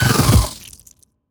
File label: biter death, creatures